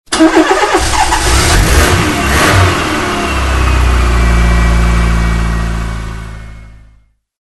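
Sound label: sound of car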